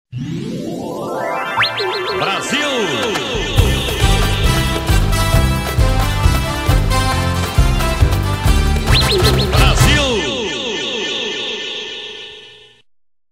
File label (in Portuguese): vinheta de gol; brasil; globo sp